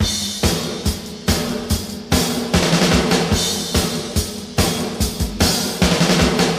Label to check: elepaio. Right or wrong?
Wrong